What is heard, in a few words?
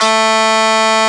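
Overdrive Guitar A3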